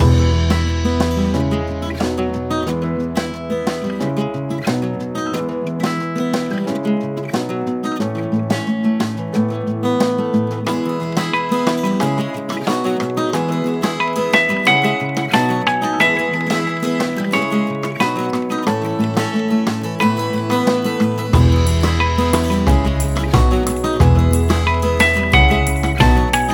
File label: Loop, موزیک پس زمینه